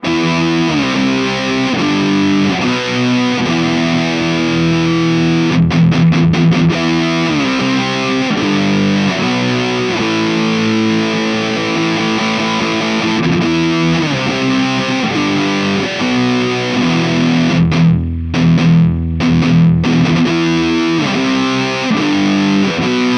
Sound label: Mooer GE300 Lite Peavey Amp